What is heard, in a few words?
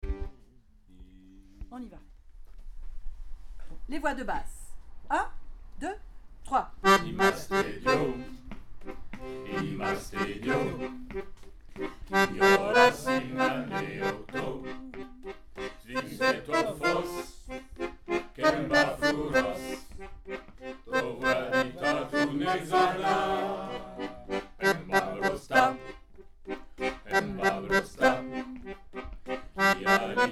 Basses